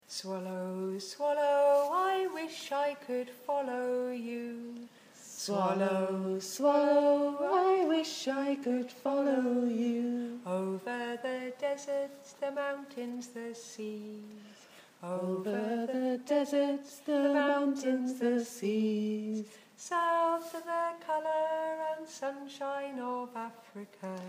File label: Swallow Song